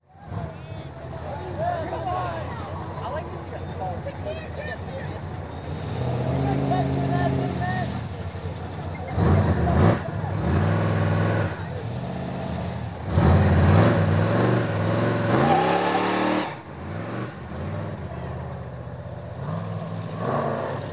Vehicle Muscle Car Rev Burn Out Slightly And Speed Off